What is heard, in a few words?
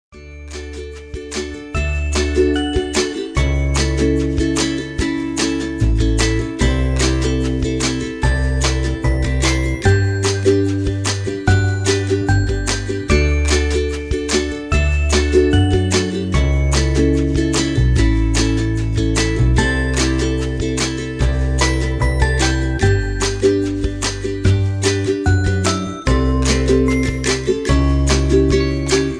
Cruisin Ukulele 74bpm